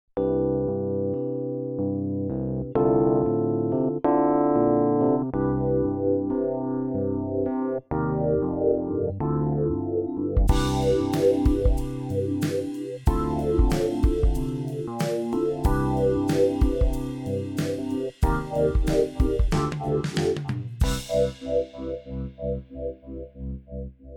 vintage electric piano; vintage keys; logic pro

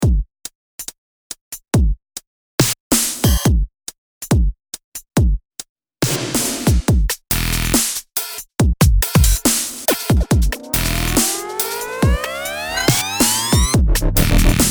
dubstep, ultrabeat; logic pro

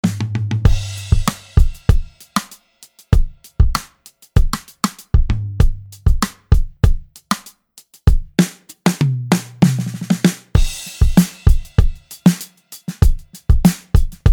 neo soul, drum kit designer; logic pro

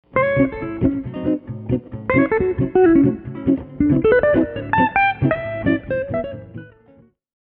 studio combo, amp designer; logic pro

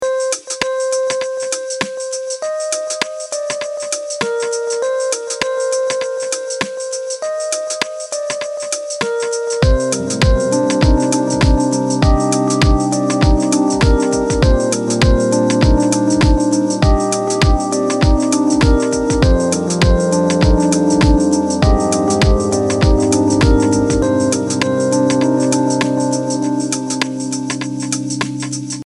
deluxe classic, vintage keyboards; logic pro

